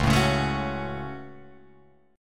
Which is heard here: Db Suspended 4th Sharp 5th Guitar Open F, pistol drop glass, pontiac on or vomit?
Db Suspended 4th Sharp 5th Guitar Open F